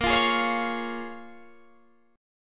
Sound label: Db 6th